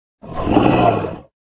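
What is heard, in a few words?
werewolf attack voice middle; oboroten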